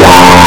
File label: FX GTR, GUITARS KIT